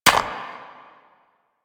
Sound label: bird three single step; Ambience